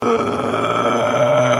samsung s2 scream

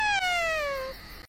ah

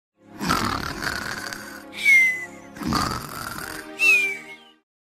snoring

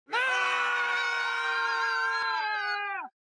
fleas screaming

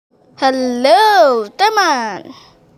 hihia

